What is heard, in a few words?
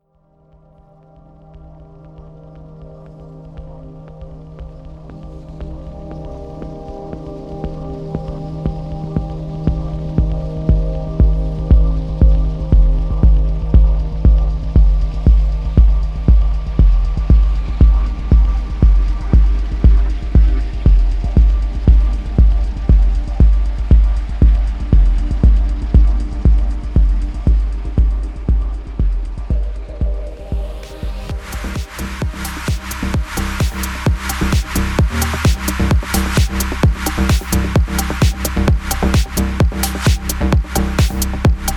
Chill HOUSE